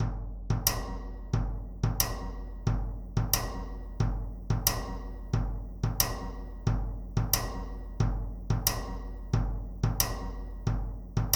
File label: Drum lower tom and high rim; Legend of Dungeon